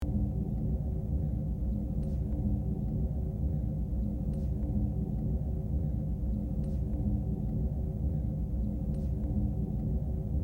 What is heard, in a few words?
engineering hum; background; tng